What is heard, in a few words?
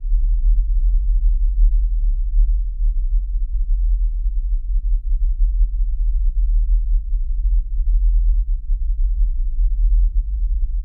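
spaceship rumble; spacecraft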